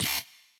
wobble1

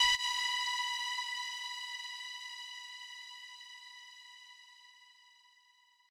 lead

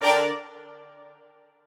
strings

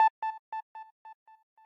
arp

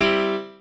piano

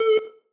synth

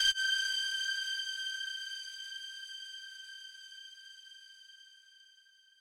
lead

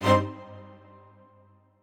strings

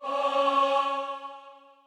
choir2

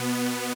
lead o4b

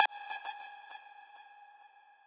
lead

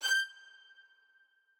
strings

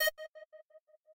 synth

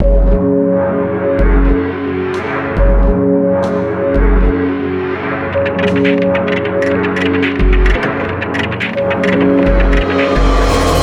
BGM; Luxembourg Zwee

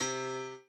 piano o5c

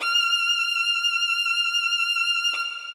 solo

